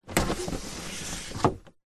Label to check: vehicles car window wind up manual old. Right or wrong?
Right